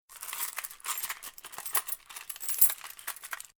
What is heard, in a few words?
Coin Rattle; Coin rummaging, coin movements. Can also be general small metal movements, like a key in a lock.; Money Sound Effects; Lynne Music